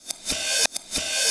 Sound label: Cymbol Particle; Particles